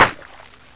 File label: rico wat; flying